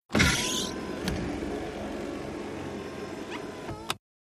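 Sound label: Electric Sunroof, sunroofs; accessories sunroofs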